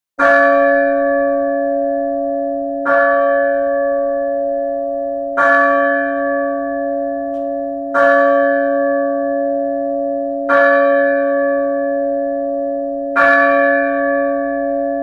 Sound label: Church Bell 3 Large D3, bell towers